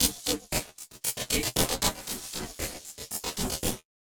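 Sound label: rhythm loops, 116 bpm; Rarefaction - Poke in The Ear With A Sharp Stick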